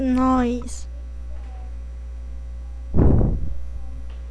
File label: noisy